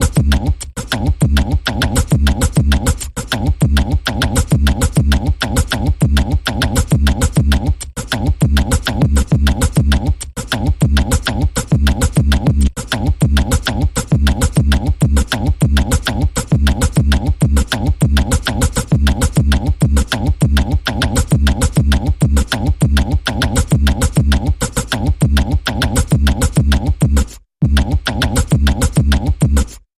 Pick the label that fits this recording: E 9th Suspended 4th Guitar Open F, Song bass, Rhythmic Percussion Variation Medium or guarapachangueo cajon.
Rhythmic Percussion Variation Medium